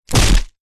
impacts body person heavy

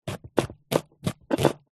food chopping onion fast with knife

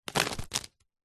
leisure wad of playing cards throw drop

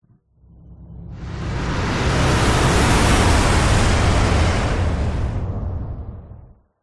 whoosh; orlando lanzini

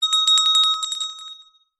bell small hand ring short

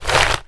paper sheets many crumpled compress movement; foley; zapsplat

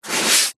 office sponge brush single stroke on paper; zapsplat

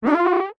office whiteboard rub fingers 2 squeak

